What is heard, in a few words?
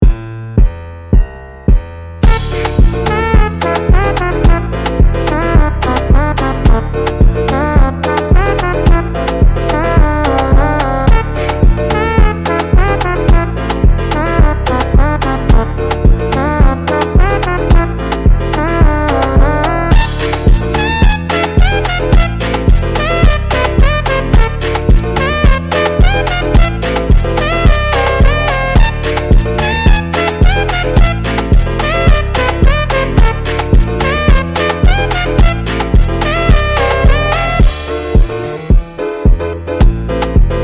slowed SONG